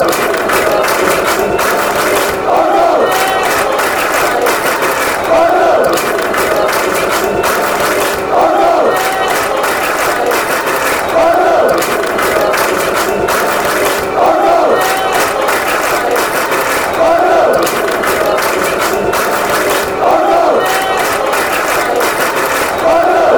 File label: argyle clap, chants